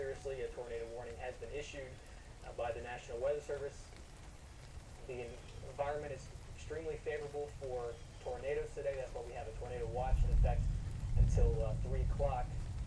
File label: sounds at my house now